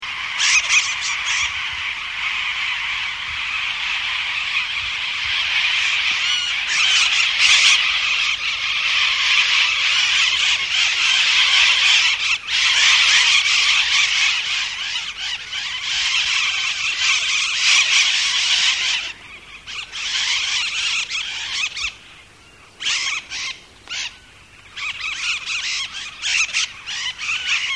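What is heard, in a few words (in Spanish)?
calancacom, Calancate Común, Aratinga acuticaudata, Blue-crowned Parakeet; cantos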